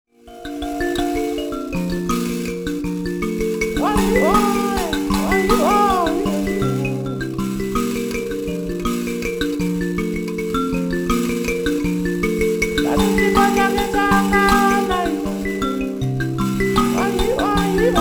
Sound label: mbira